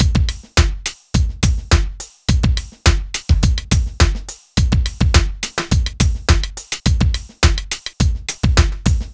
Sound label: Beat, Drums, Eclectic Electronica